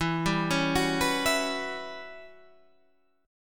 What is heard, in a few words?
E Minor 6th Add 9th guitar chord, Standard; Em6add9 arpeggiated; JGuitar